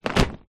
industrial cable thick heavy coil down on surface

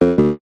multimedia game sound error incorrect

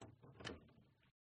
Door Bathroom Hollow Core Open; uberduo

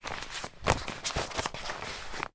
household baby changing mat portable fold up; sound effects one

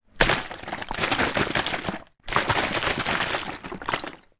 household water bottle sports plastic shake water

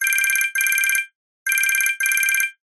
multimedia voip call ring software; zapsplat

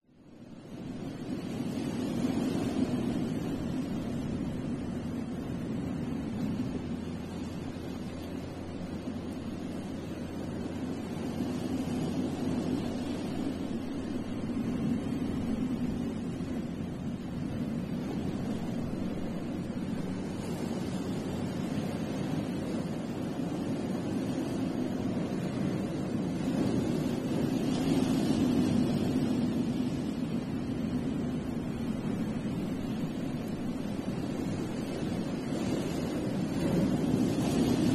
nature ocean distant stormy surf; zapsplat

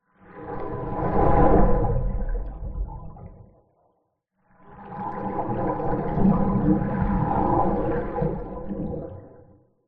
underwater move swim; lukas tvrdon

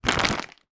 foley bag plastic bag for life pick up; zapsplat

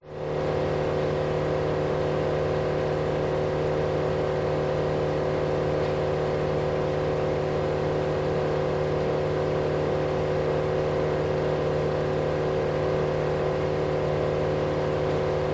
industrial wood chipper operating 5 metres away; zapsplat

